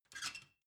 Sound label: ladder metal handling short